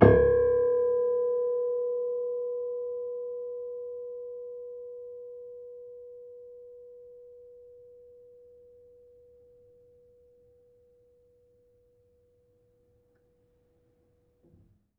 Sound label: Harm Low, Piano Harmonics